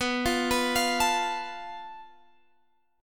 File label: A Suspended 2nd Sharp 5th